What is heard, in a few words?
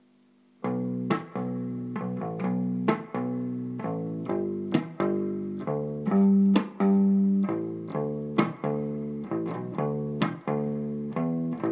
practising bass through peavey guitar amp